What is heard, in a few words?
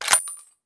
sniper round out; valve